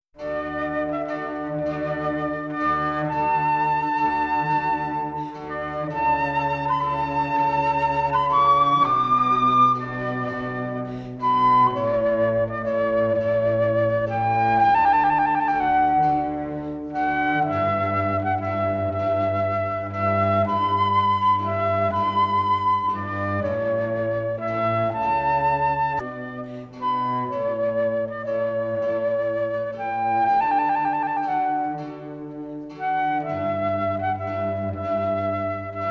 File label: Flute Concerto in D; Flute Concerto No 3 in D Major RV